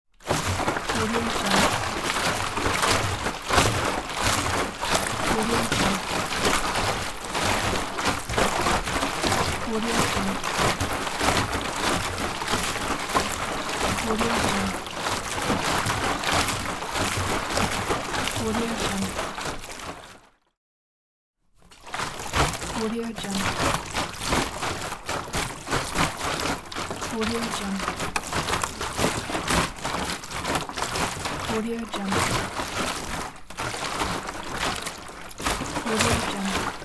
shake water barrel; teldrassil

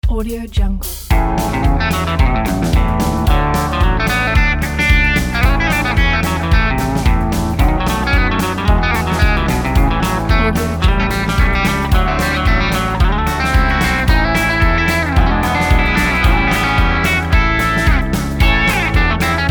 happy country guitar

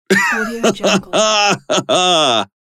happy man laughs

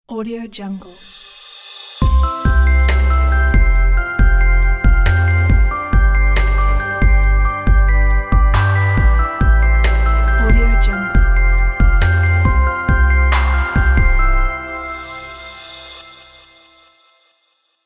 short beautiful logo

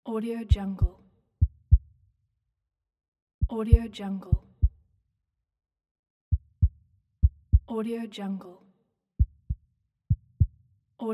slow heartbeat

